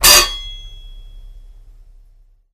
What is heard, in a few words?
metal hit